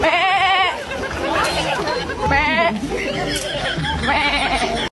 speaking like a goat sound effect

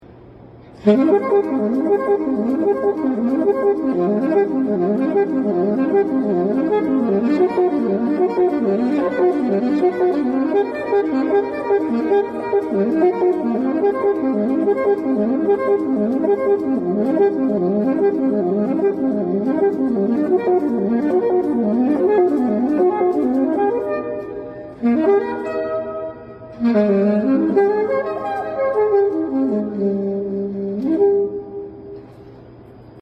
Saxaphone

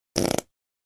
Short Fart

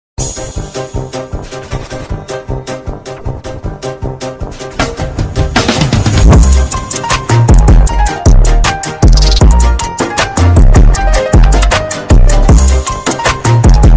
drip bass boosted; Bad piggies

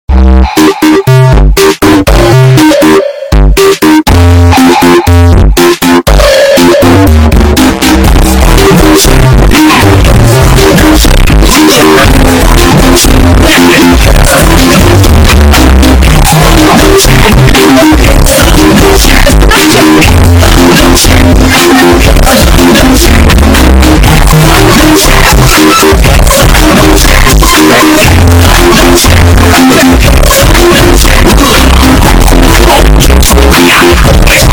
Theme Earrape; Kahoot

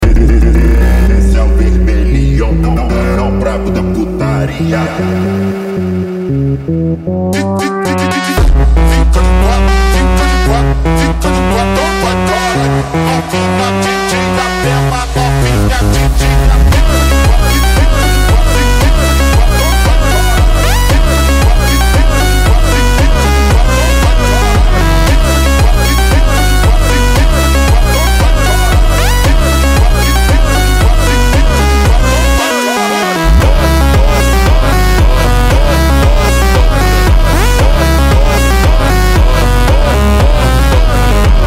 GROOVY Slowed

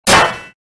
Crowbar Sound; HalfLife 2

